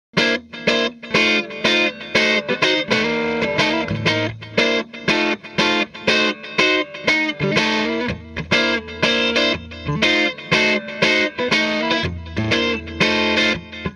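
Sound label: m75 lp neck pickup clean deluxe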